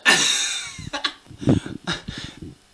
naurahdus

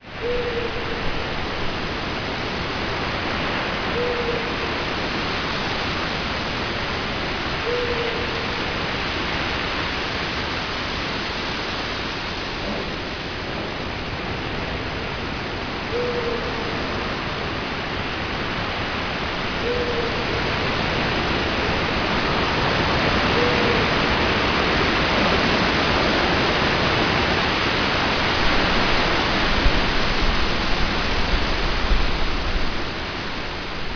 syksy

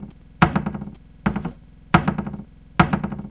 ovi kaappi

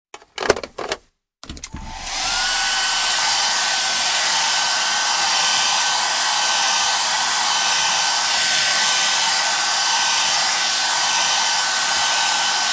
hiustenkuivaaja

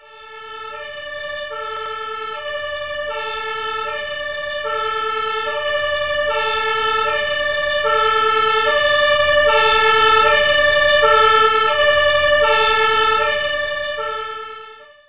ambulanssi

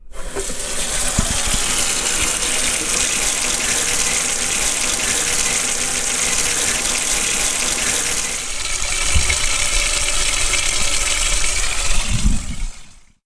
vesihana

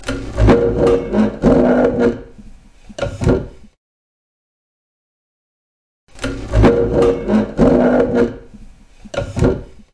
tuoli

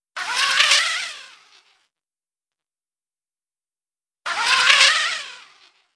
vetoketju

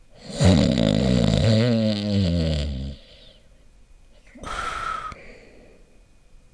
kuorsaus

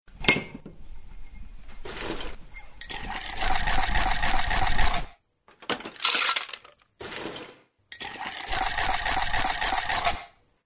sekottaminen

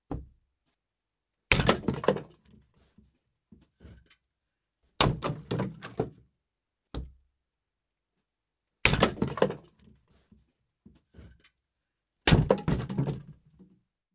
Kirves

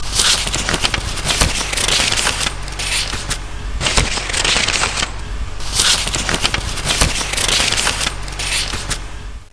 kirja

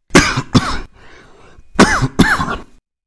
yskiminen

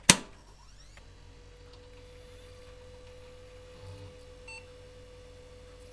tietokone

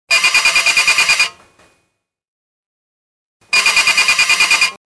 puhelin

